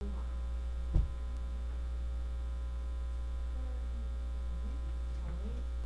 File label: register